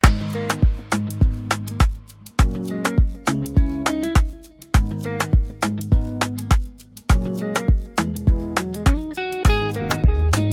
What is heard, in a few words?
BEAT